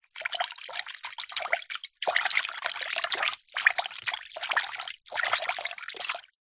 foley liquid hand aggitate movements water in plastic container shallow